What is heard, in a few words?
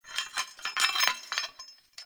Smithy Metal Misc; Soul Plane